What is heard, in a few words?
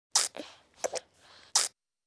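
baby sucking pacifier, kids; Asian Show Sound Effects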